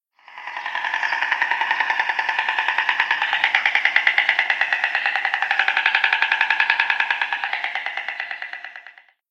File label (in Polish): bocian; mis; mlodsze przedszkolaki; glosy